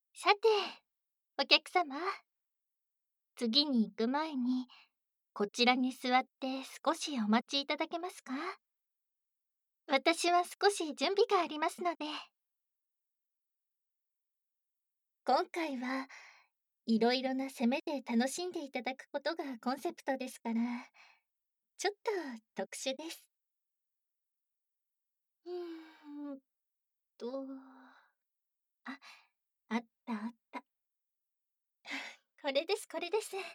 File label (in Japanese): 耳舐め焦らしと、乳首オナニー SE音声なし 左右逆, ASMR